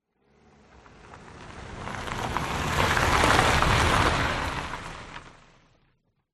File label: vehicles car approach slow pass dirt gravel